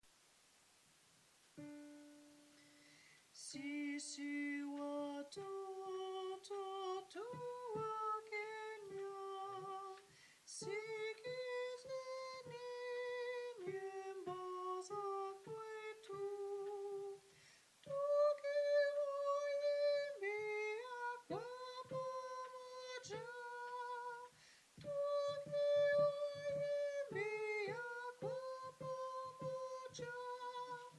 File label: sopranes; kenya melodies